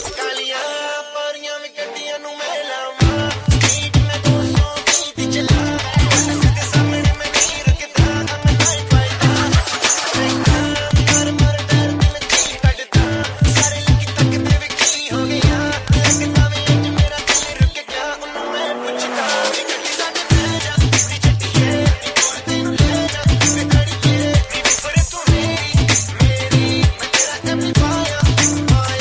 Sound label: Dhol Mix